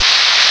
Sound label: slide